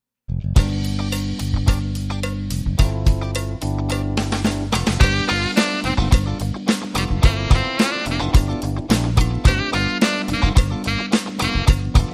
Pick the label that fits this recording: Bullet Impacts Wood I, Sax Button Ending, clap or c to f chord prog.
Sax Button Ending